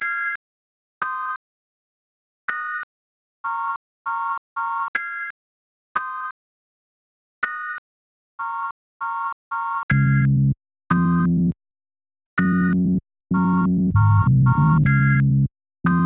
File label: sine wave